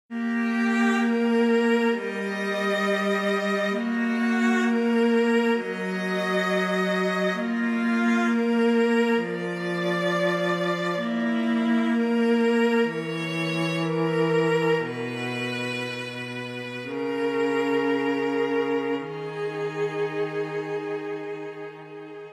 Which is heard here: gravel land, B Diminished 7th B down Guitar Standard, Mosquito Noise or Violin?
Violin